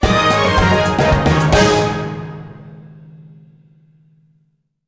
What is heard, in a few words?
Jingle Minigame Clear; Inazuma Eleven Strikers 2012 Xtreme; Wii